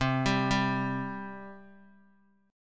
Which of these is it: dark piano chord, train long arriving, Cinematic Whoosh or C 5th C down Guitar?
C 5th C down Guitar